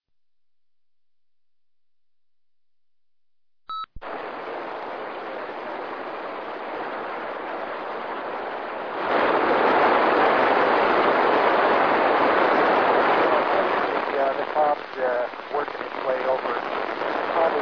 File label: noisy